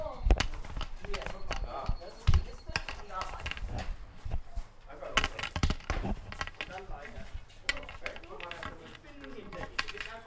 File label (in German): blindenstock; Eine Reise in die Dunkelheit